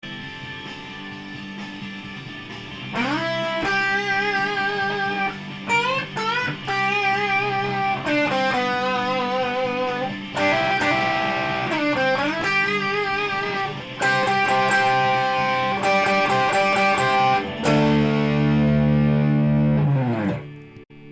solo, guitar

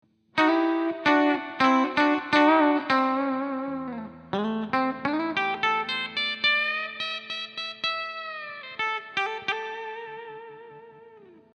hard guiyar solo

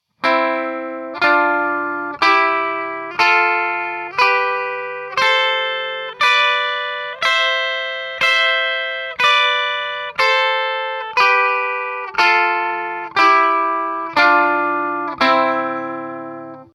hamori guitar

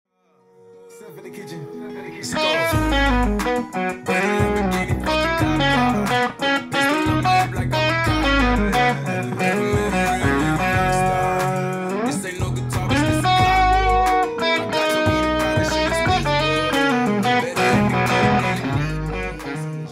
guitar

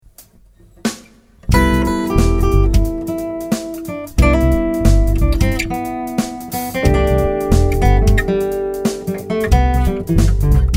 Cmaj7 Eflat Maj7 Solo

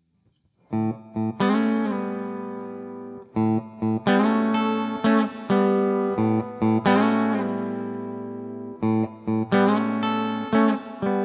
standerd guiyar chord

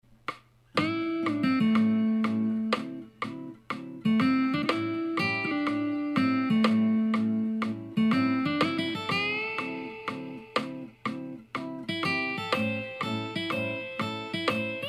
a blues solo

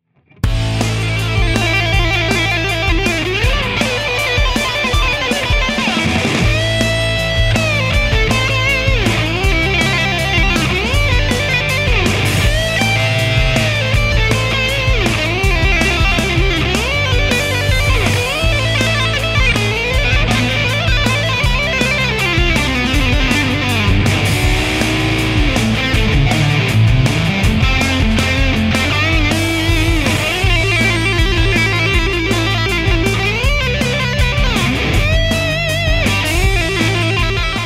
kintore gitar solo

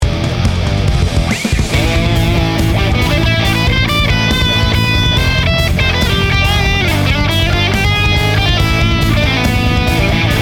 metal guitar solo

